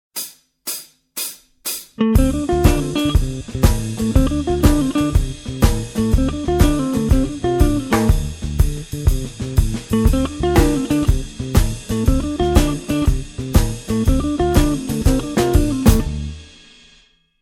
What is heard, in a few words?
blues jazz